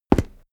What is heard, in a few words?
step stone walk; schritte